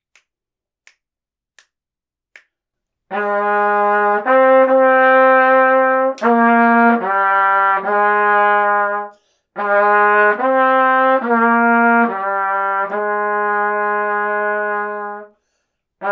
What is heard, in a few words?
duet 2nd part, trumpet; dvorak9